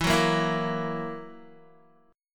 E Suspended 2nd Flat 5th E down Guitar, Esus2b5 chord; strummed; JGuitar